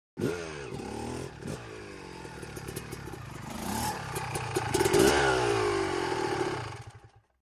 Motorcycle Motocross; In / Stop / Off 250 Cc Honda, Bike Drives In, Stops And Shuts Off, Shot Cu.